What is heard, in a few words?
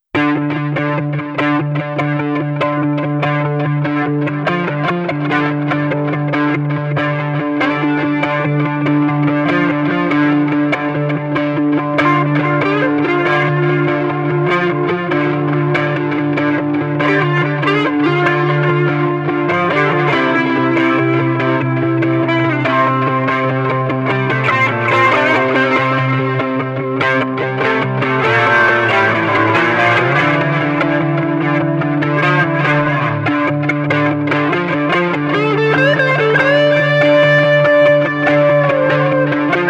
guitar